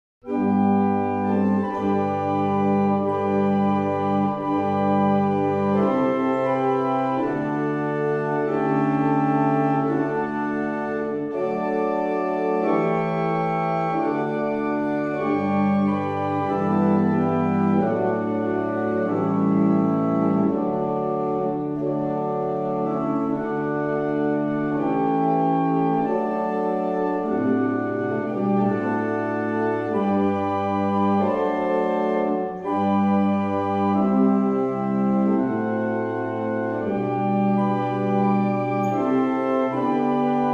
Morning Hymn, barrel organs; Stratfield

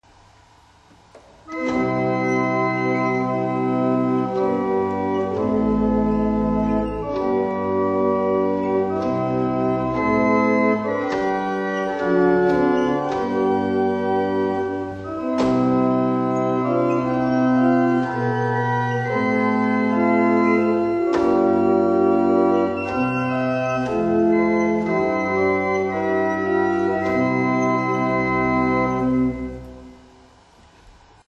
Double Chant, barrel organs; Tittleshall

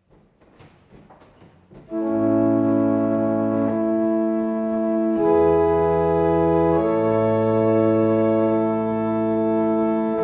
barrel organ; Letheringsett

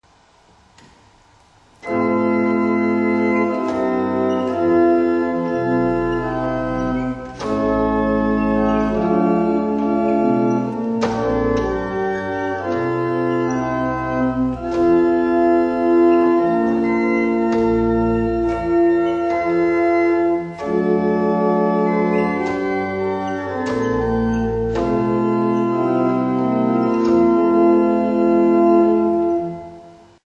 Double Chant, barrel organs; Tittleshall

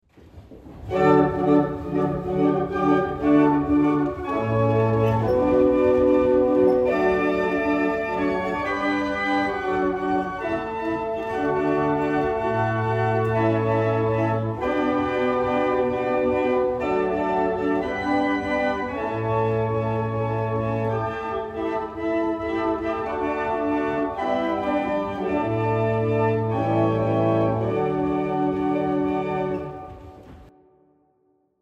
Double chant, barrel organ; Norton

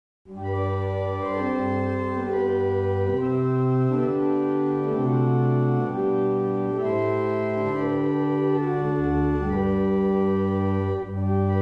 Careys, barrel organs; Meldreth

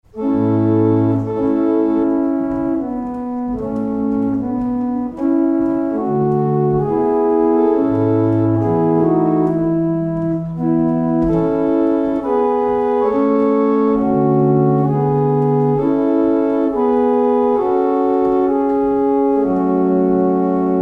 barrel organ; Letheringsett

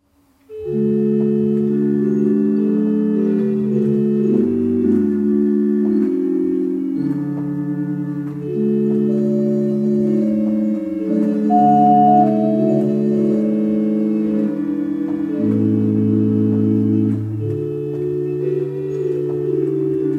barrel organs; Blacktoft; Tranquility

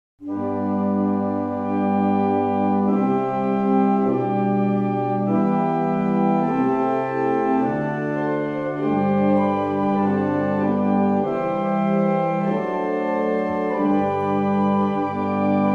Evening Hymn, barrel organs; Stratfield

